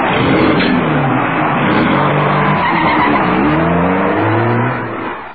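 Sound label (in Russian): звук заноса гоночного автомобиля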